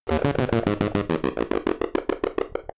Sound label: robotic rattle